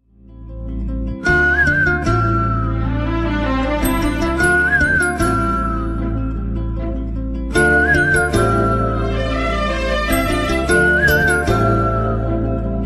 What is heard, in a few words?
bg tone ringtone